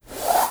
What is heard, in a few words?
Rubbing Scratching Porte Studio